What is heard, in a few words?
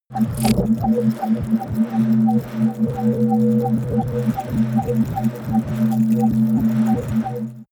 promo pad